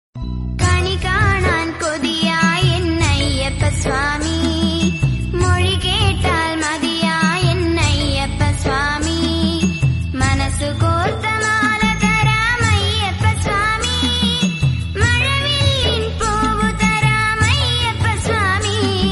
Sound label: ayyappa swamy ringtons telugu